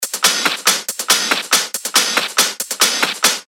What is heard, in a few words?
140 BPM, techno loops; Bachelor; Revealed Techno Top Loops Vol. 9